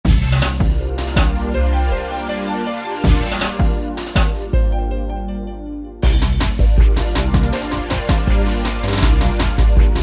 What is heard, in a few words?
Drum'n Bs, Yamaha DJX Styles